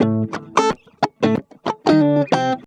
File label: ACID JAZZ; Best Service Advanced Media Trax Modern Composer